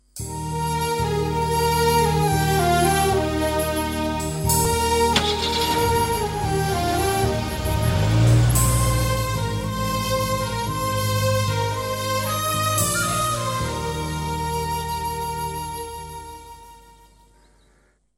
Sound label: musical